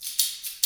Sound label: metal jangle, perc fx; Roland LCDP14 Africa VOL-2